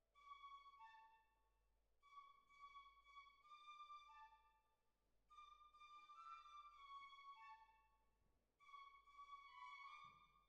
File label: Cbasses